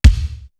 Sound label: thick kick; East West; Global Sounds